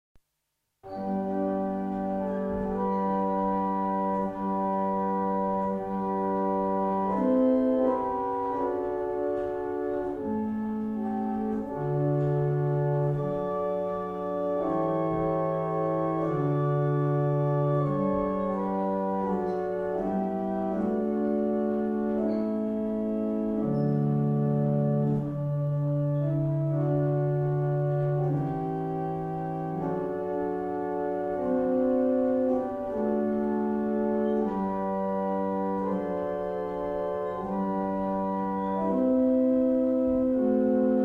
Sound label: Morning Hymn, barrel organs; Sutton Beds